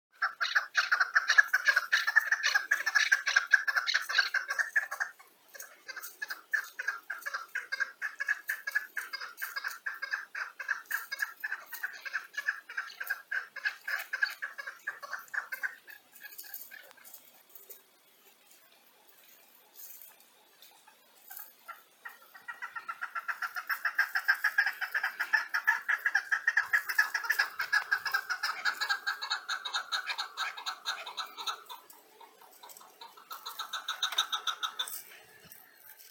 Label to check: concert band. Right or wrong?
Wrong